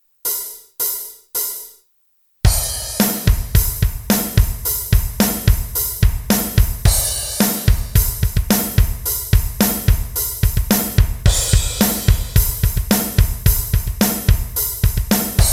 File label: music drums; bibis house of kabob